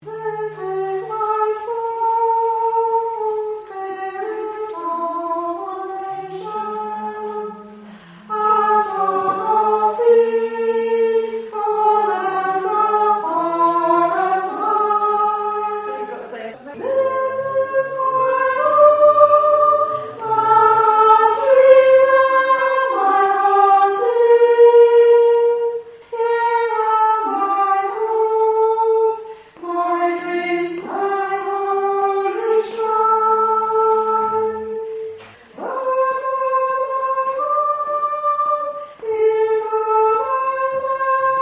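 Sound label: soprano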